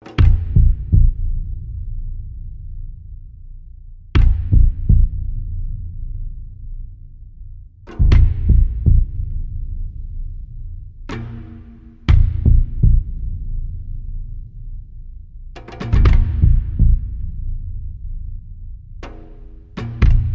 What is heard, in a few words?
Bass and Reduced Percussion Only